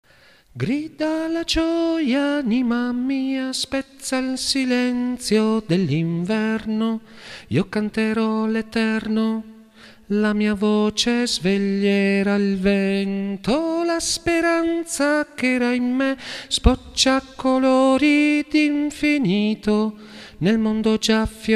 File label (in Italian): grida la gioia tenori